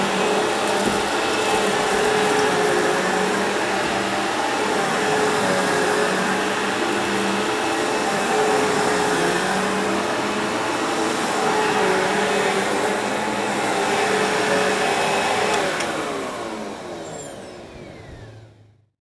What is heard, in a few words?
vacuum cleaner